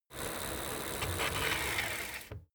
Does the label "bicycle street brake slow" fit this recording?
Yes